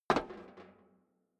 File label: step boots metal rattle single stair up; schritte